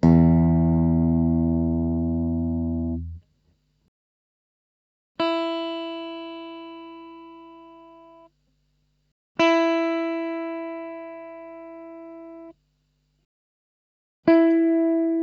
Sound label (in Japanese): エレキ・ギター; 電鳴楽器; ３機種比較